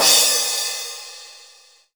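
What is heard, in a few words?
cymbal, right; Best Service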